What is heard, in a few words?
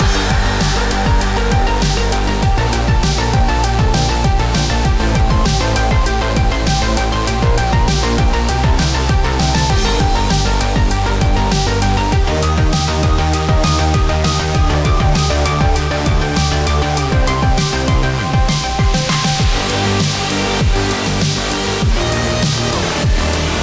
BGM Loop